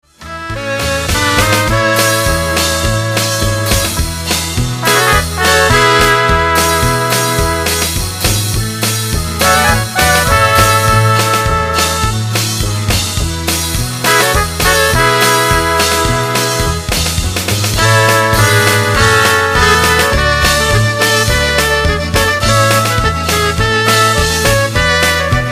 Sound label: INSTR